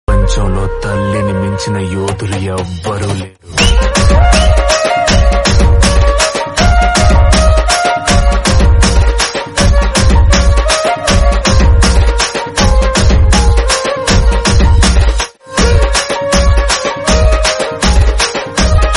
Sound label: remix bgm